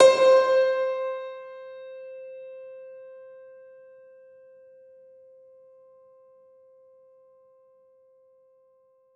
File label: Hard Verb Piano C4; Synthetic's Serum Collection; Aubit; Broox Vol. 1